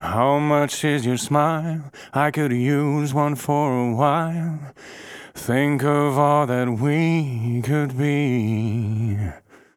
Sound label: Fizz, Mixing Lead Vocals; ParaFizz